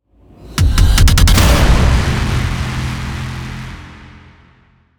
no synths

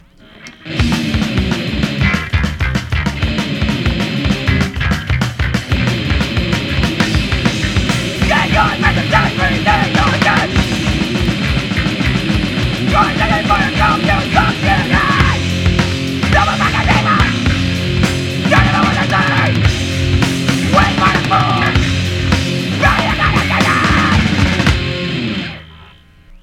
bass fill song

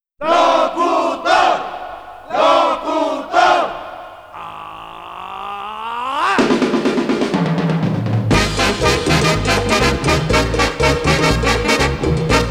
mambo

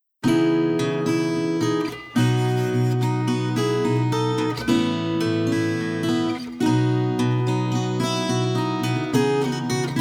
acoustic

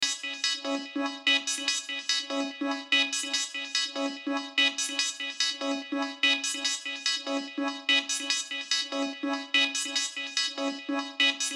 acoustic

